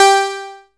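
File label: electronic sequence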